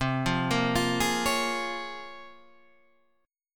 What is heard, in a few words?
Bb Minor 7th C; Bbm7/C arpeggiated